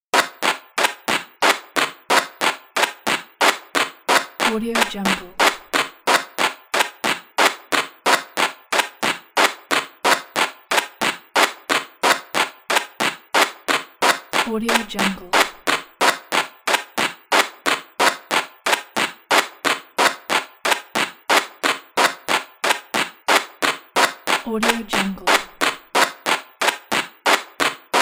electronic scratching in a ticktock motion

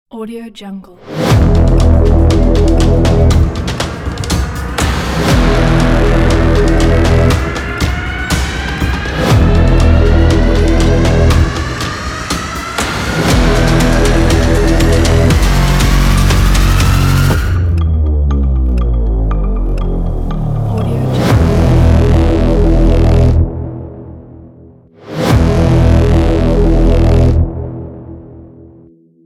cinematic teaser ident

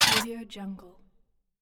alien insectoid monster voice

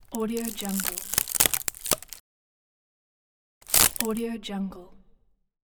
crackling

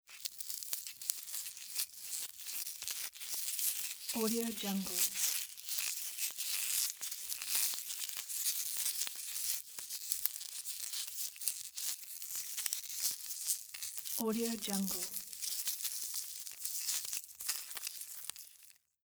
styrofoam continuous squeaks

